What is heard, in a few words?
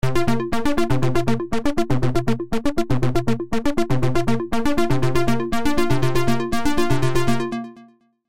pulse wobble